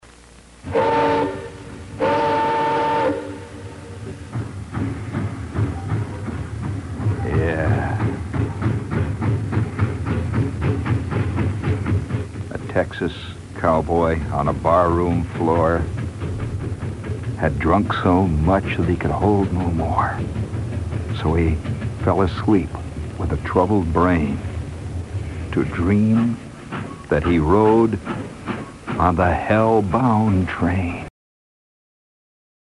train sounds

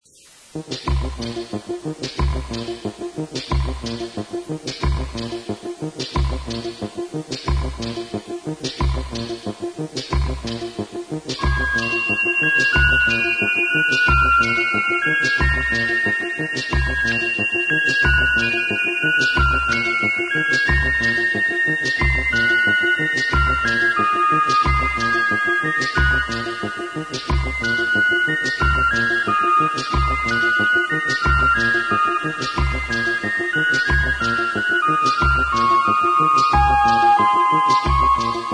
happy whistler

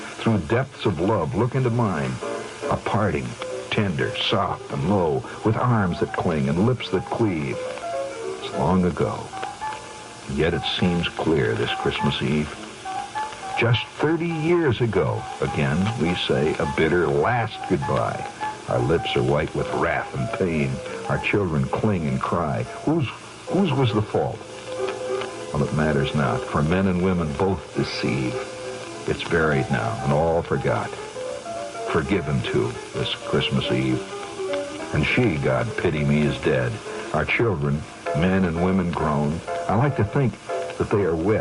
Piano

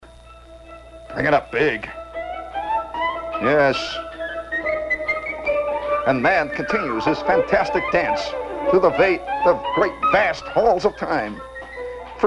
violin concerto no1 in D minor

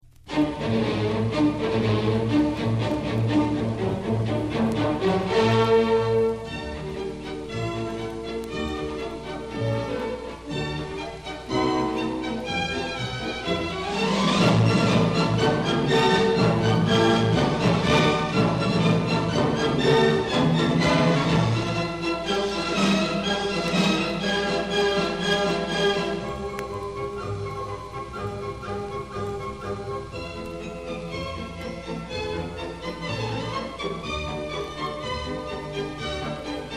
Marche Militaire

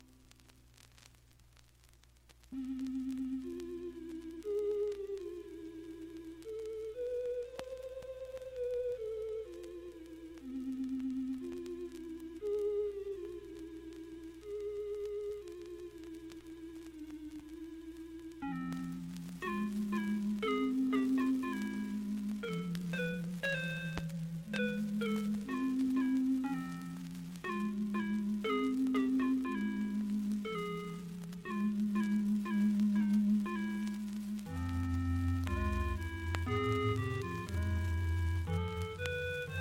Variations In Timbre and Attack

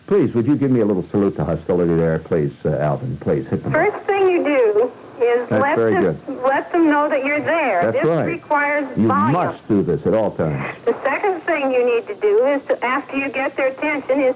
hog calling

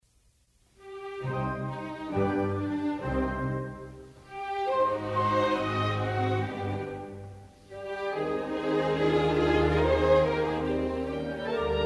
piano concerto; no 24; Ailegretto

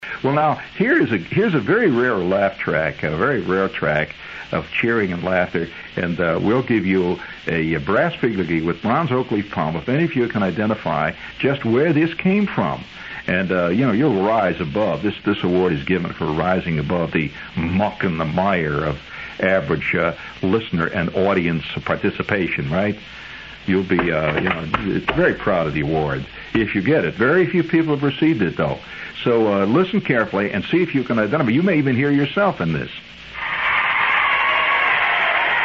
Kids Cheering; Wonderama

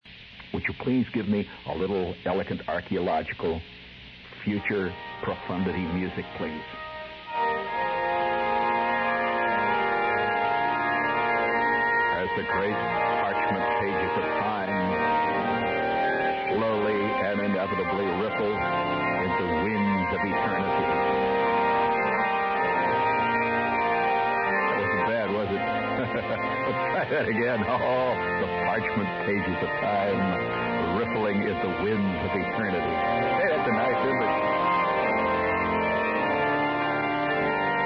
Prelude in E flat major

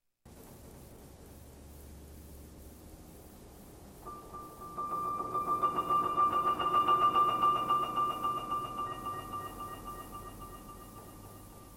Underwater Waltz

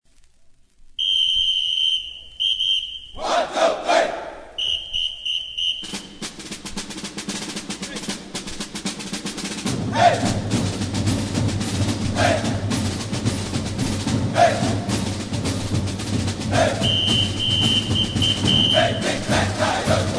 fanfare; grambling

